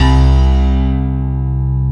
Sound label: PIA OLD, PIANOS